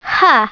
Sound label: HUH